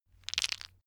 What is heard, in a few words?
stone gravel clatter short; natur-tiere; steine